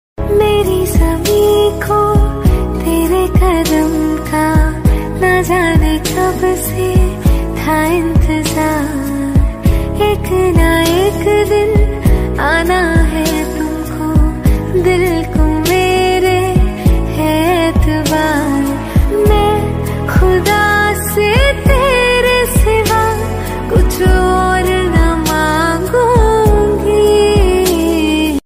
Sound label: Best Female Ringtone